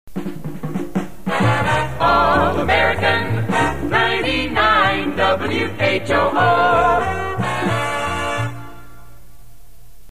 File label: jingle; All American 99 WHOO